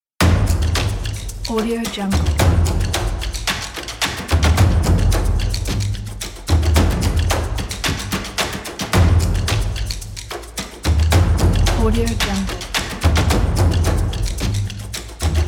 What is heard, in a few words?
stomp